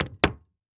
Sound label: Foley Footstep Hard Sole Dress Shoe Jump On Hollow Wood Surface; Foley Footsteps